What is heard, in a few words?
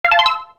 RED COIN; YWW